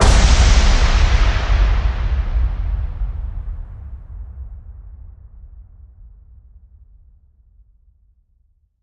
Hit Amb Sheet Metal

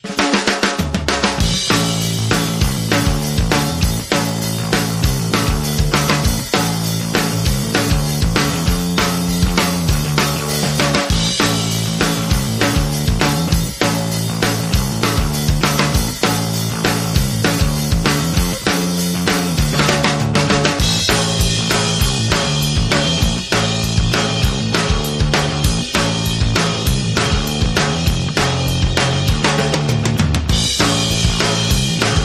Drum and Bass

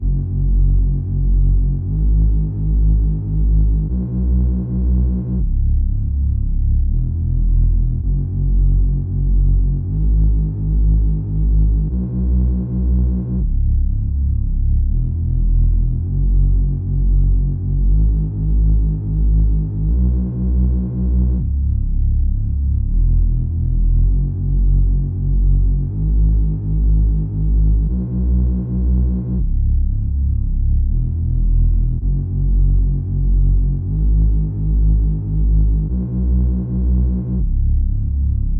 Underscore Bass

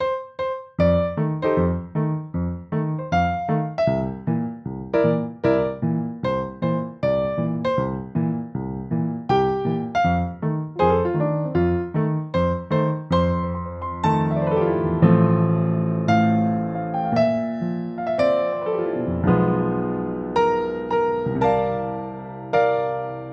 Upright Piano Happy Birthday

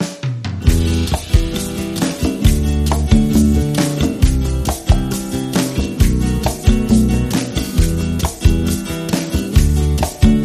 Spanish Guitar, Underscore

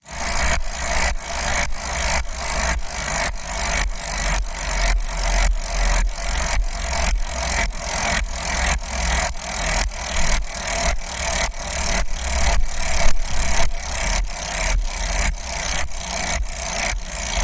Pedal Pumper Pulse

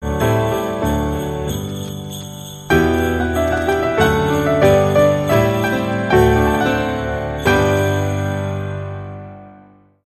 Ding Dong Merrily On High

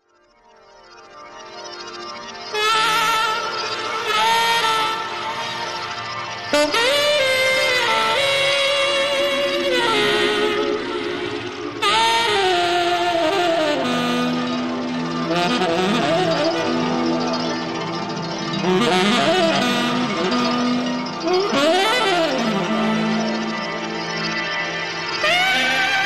Haunted Sax

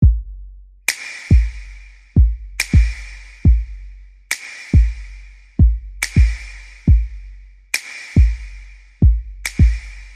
Percussion Bass and Effects

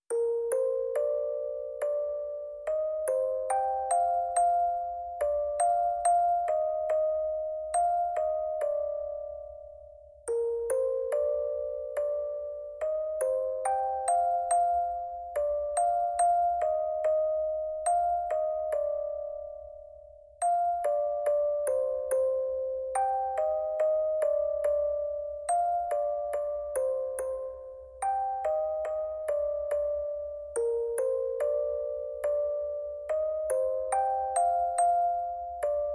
Music Box

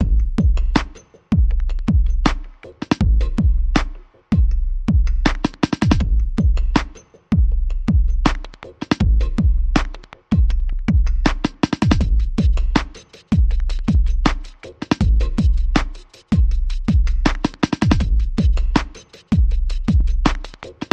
bass and drums only

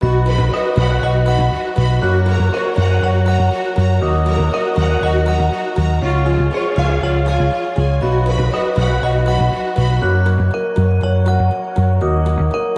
Joyful And Inspiring, No Drums

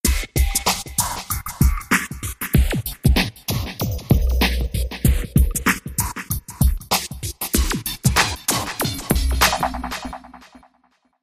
Drums & FX

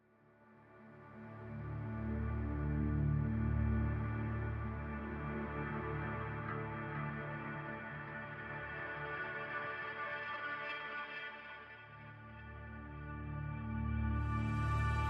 Pads Only

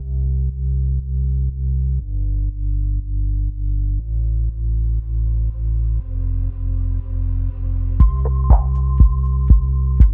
Drums Bass and SFX Only

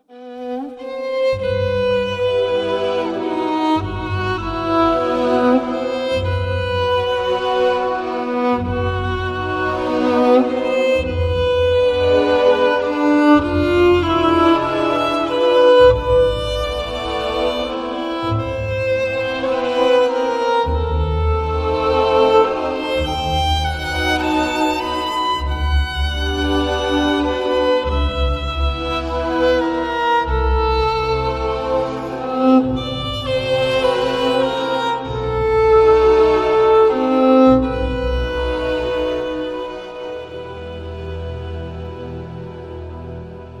Strings & Violin

